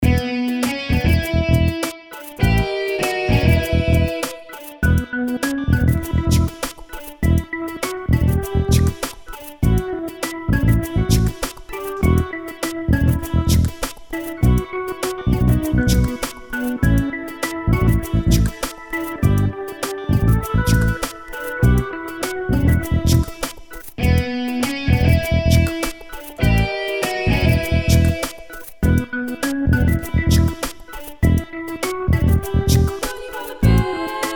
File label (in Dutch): zonder zang